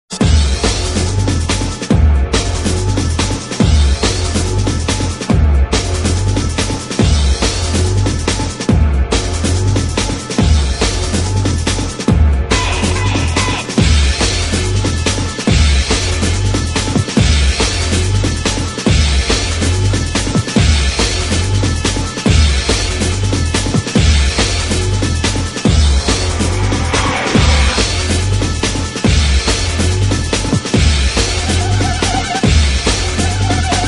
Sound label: Instrumental